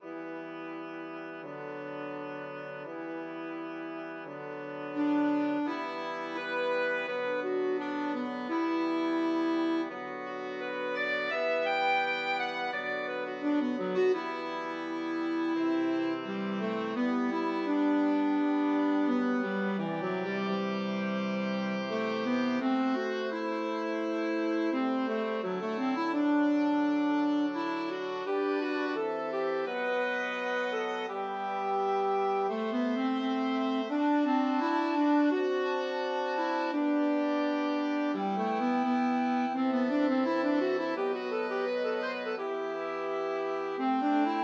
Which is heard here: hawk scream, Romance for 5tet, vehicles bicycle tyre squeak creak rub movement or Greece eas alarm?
Romance for 5tet